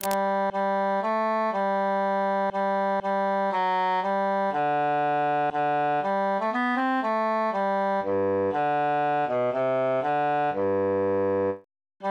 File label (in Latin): bassus solo; sing we and chant it